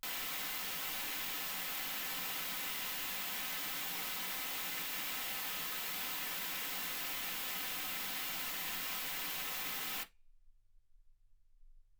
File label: wgn